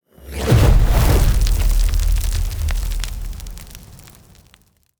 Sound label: Box Fire Explosion Big; TANK; 3Souls